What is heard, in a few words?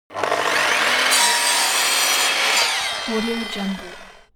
construction machine table saw on load